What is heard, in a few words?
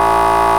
Ring; memory-sound; gcompris-qt